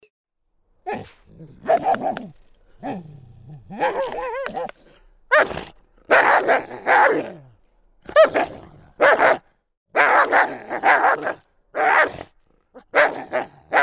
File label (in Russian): jarostnyj laj volka